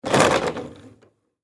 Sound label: Foosball Table; Foosball Table Players Triple Spin Hard